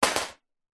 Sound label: stone drop onto small loose metal panel; foley; zapsplat